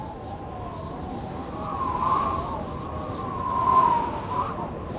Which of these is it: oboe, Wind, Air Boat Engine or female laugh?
Wind